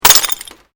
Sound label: GLASS SHARD SMASH